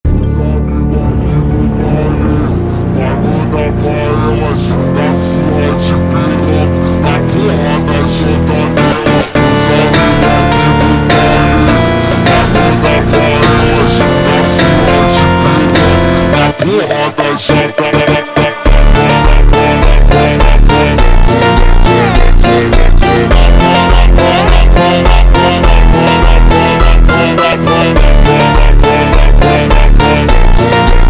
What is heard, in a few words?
Funk, super Slowed